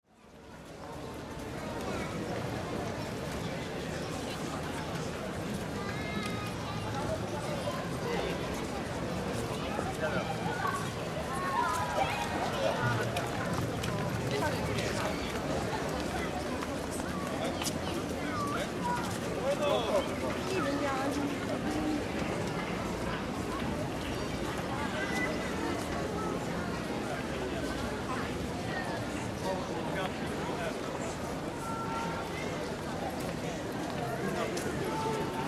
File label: Surround City Ambience Pedestrian Traffic; Crowd, mid-afternoon, downtown Warsaw, Old Town pedestrian ar; City Sound Effects